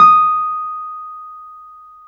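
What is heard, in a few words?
Piano Wurly D#7; Keyboards of The 60's and 70's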